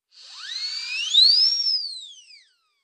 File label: Horns 3, Various Comic Horn Effects.